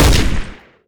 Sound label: bar shoot, weapons